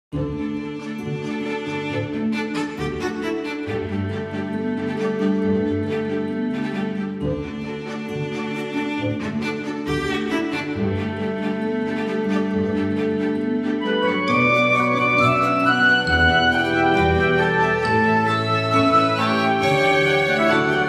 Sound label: GTR